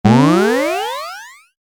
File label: Generic Bonus Or Pickup, video game; Video Games; Stuart Duffield